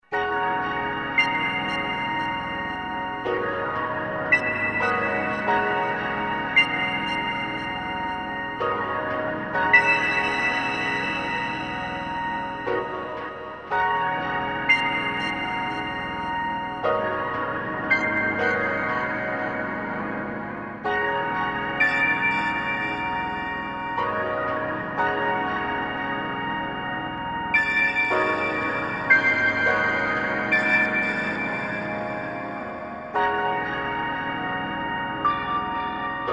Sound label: pad lounge, Pad: lounge, Roland JV2080